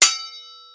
sword strike; weapons

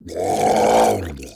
zombie

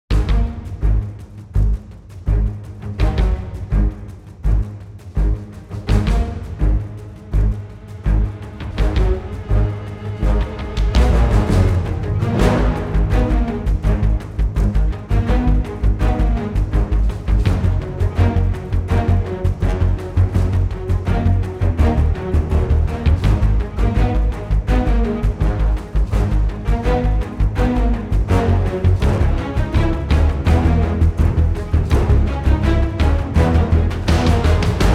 combat

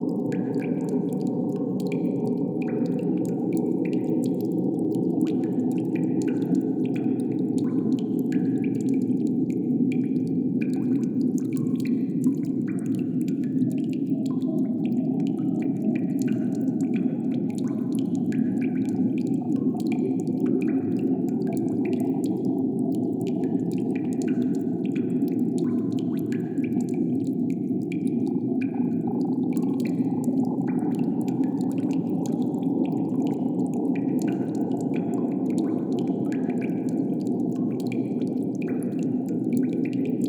cave; ambiance

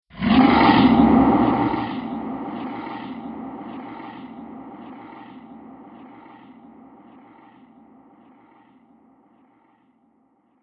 horror sound monster roar

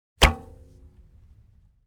arrow; weapons

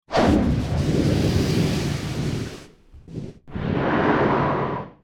breath, dragon green tiny

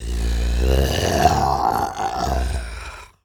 zombie skeleton hit

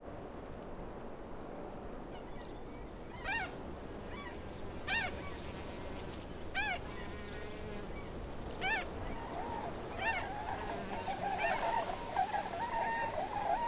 marsh; ambience

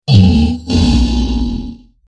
die, troll

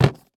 set, figures set on table

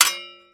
sword strike; weapons

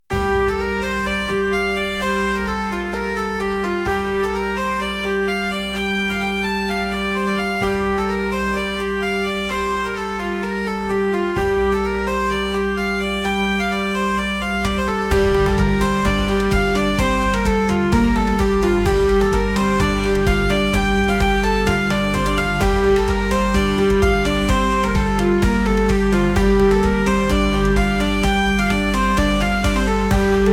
calm

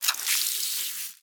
damage, spider

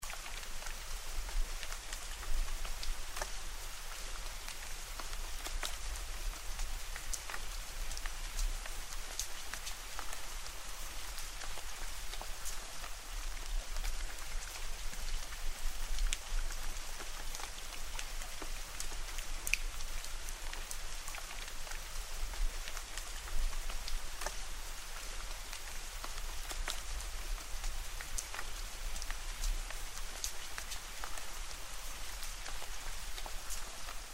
drizzle; ambience

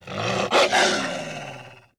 wolf

